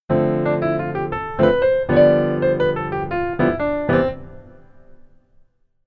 keyboard solo right hand

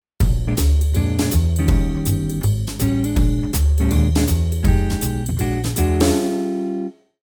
guitar pattern

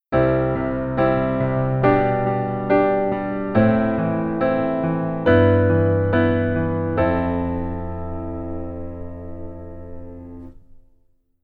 Keyboard Rhythm Basic

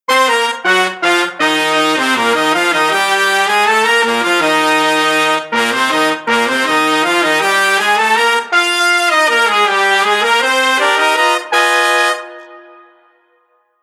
Horn

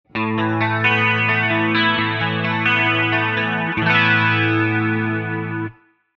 guitar Chorus Digi

